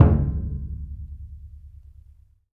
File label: AFRO DUNDUN, LRG DRUMS; Spectrasonics; Heart of Africa